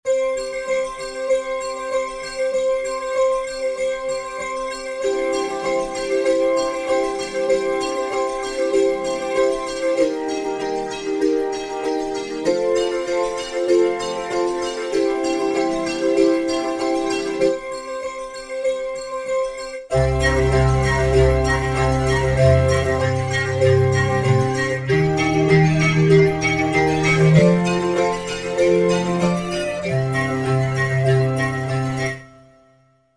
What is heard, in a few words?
Bowed Bells, Roland V-Synth; Factory Patches